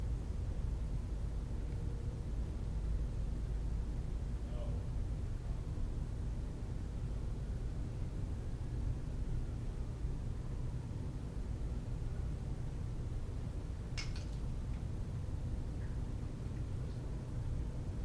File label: NO and something drops